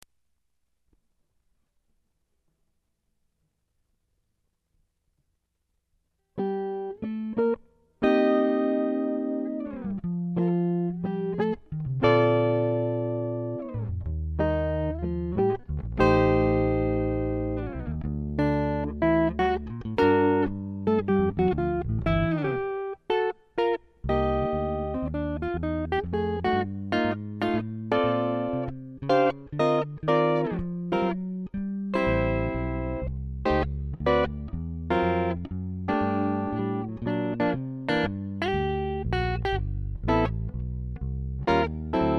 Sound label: duet